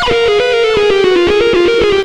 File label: xtra lead